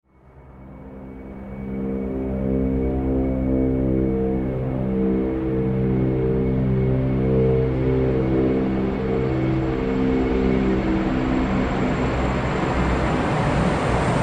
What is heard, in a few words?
large military helicopter sound